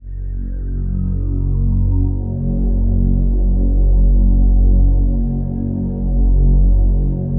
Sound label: COSMIC, PADS; Masterbits; Vintage Voltage